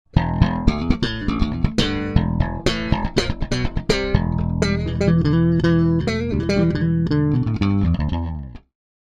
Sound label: bas